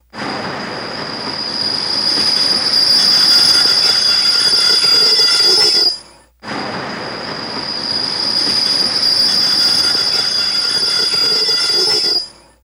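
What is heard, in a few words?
Car Brake Screech Stop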